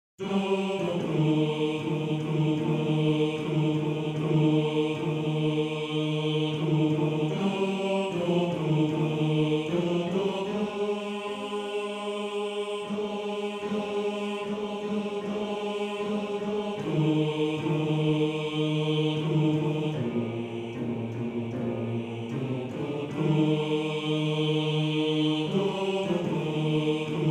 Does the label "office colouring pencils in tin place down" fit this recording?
No